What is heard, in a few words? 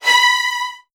B3, VN 16TH, Virtuoso Strings; ILIO; Kirk Hunter